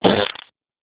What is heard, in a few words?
fart; human